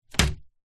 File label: foley paper airplane land hit window